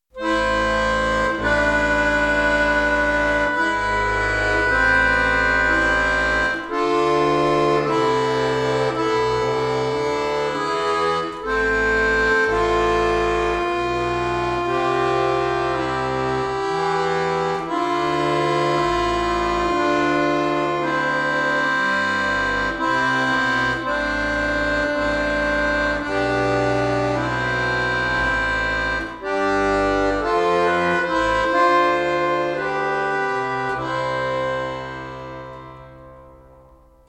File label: corelli adagio